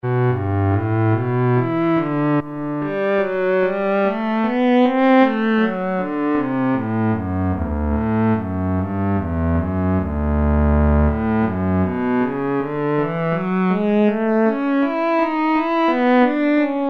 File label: Cello, Roland MKS-50; Factory examples